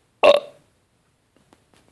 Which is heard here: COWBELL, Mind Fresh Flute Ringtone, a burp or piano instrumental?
a burp